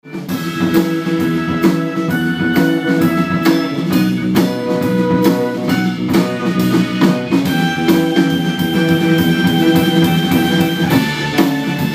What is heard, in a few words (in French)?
soiree jazz au pannonica enregistrement